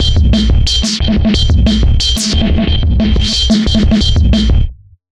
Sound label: 090bpm, Downbeat Dance Grooves